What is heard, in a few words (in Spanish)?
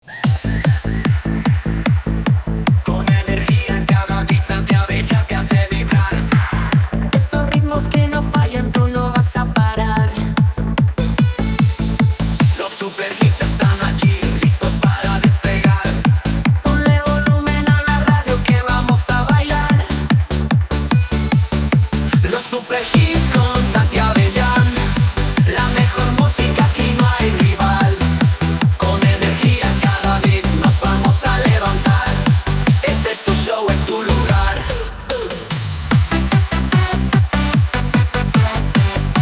JINGLE ENTRADA; LOS SUPERHITS